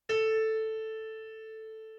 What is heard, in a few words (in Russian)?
la piano